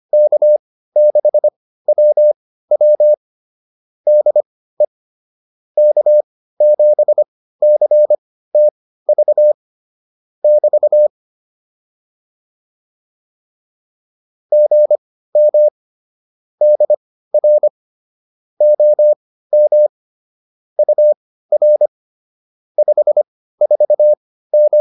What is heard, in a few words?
QSO, intermediate practice files